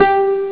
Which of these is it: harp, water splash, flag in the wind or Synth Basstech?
harp